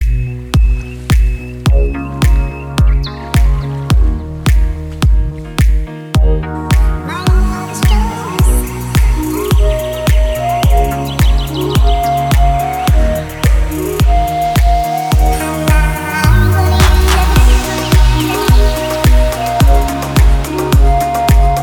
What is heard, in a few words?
Birds And Flute Meditation Music